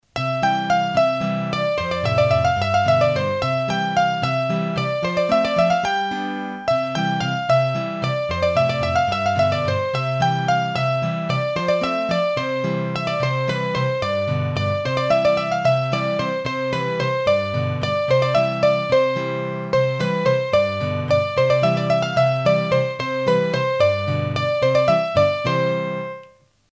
La Tricotada, Bourees d'Auvergne, hurdy-gurdy; Massif Central

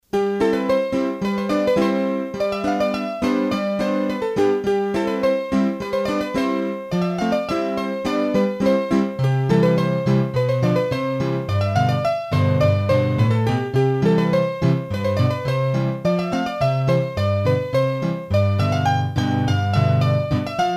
Polka de l'Aveyron, hurdy-gurdy; trad

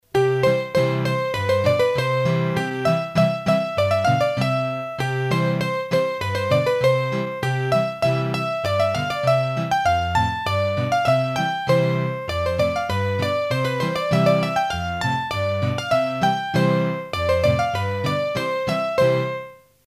Scottische, hurdy-gurdy; Massif Central; trad

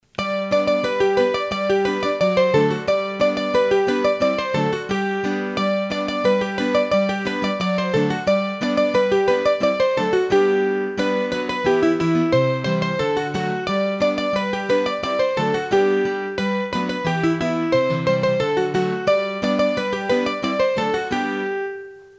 hurdy gurdy; Massif Central; Cochonchine

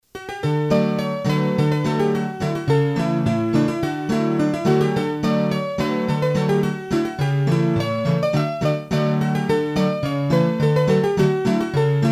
Polka, hurdy-gurdy; Polka du Pere Plumet

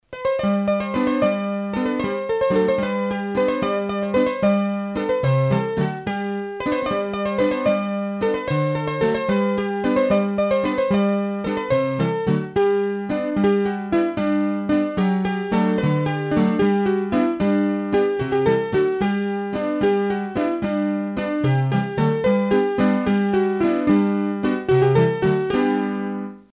Bourree, hurdy-gurdy; Bourree Sancerroise; Bourrees a 3 Temp

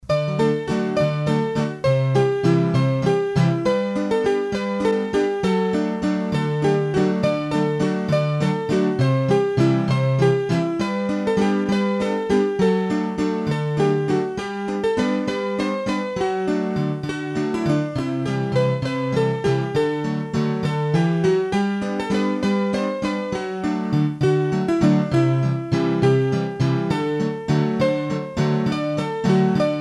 hurdy-gurdy, Valses; Les Satins Blancs

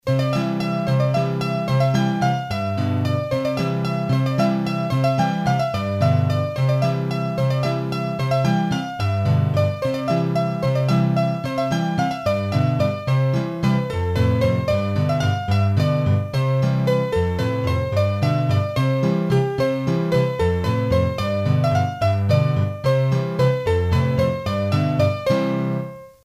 La Montagnarde, Bourees d'Auvergne, hurdy-gurdy

